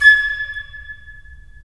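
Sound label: FL, flute; LA Composer vol. 3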